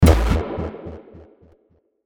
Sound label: Enemy Death